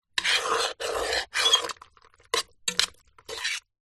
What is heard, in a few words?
pasta cooked in metal pan movements with metal spoon; zapsplat